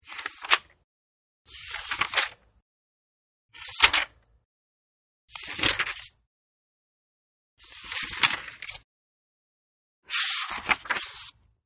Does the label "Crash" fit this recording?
No